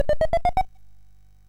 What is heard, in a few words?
MOS6581R2, GAMES; Sammy Lightfoot